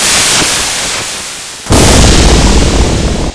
lightning; mother2

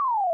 Falling; mother2

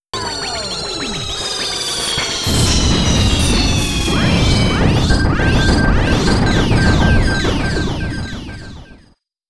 Base shuts down; mother2; Stonehenge

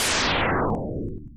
thunder hit; mother2

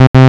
error; mother2

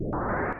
Enemy die; mother2

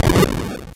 Fire; mother2

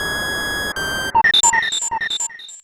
PSI Magnet; mother2

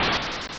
blast; mother2; Extinguising

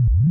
Eat; mother2

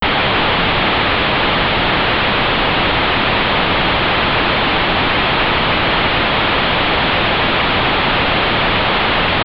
static; mother2

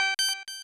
button; mother2; Sphinx

